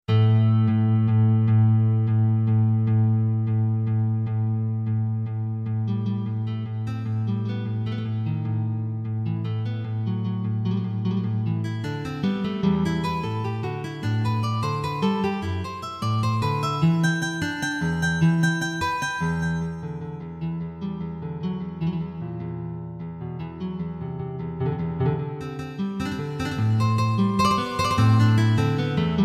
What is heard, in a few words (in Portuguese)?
para Guitarra; solo e de camara